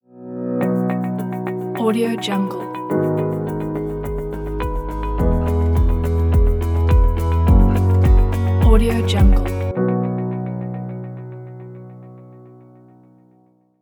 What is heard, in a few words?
for the ambient ident